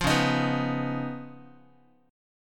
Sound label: Db 9th Db down Guitar; JGuitar